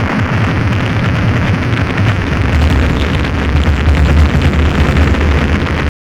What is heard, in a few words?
WAR FX; Hard & Loud Techno; USB Soundscan vol.01; AKAI